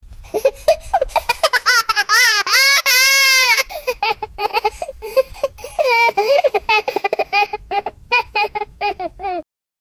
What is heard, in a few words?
kid laughing sound effect